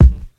nineties natural hip hop kick one shot a sharp key; Duration 0:01; Samplerate 44100 Hz